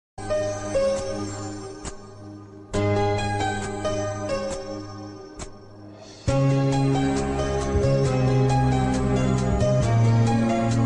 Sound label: kannada bgm ringtone; pooja gandhi